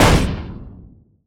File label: bot ground punch; mine; battle